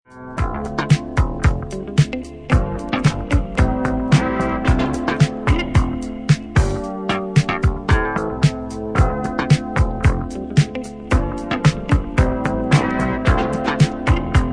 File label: Instrumental